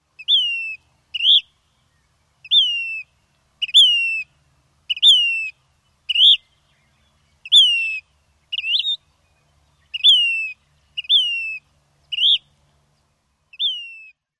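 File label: Say's phoebe dawn song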